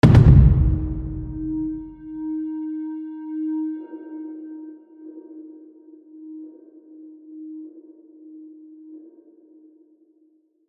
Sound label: poof